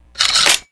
rpg reload shove, weapons

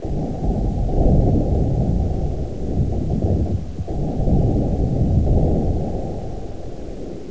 battle

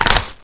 head bite; baby

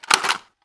tmp mag out

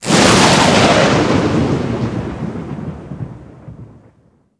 alien laser

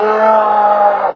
death

